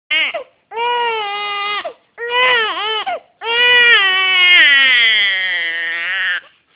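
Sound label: baby hungry, male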